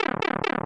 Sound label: Player Shrink